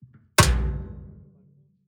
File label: Impacts SIMPLE; SoundBits